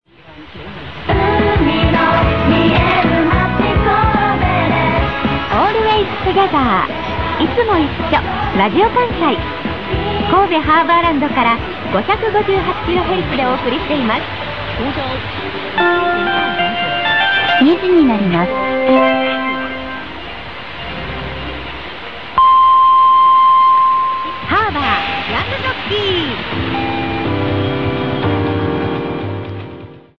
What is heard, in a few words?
1395 kHz Radio; RadioKansai